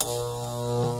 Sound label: Electric Bolt